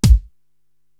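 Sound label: BD, bass drum, drum machine; Korg TR-Rack; Super Foot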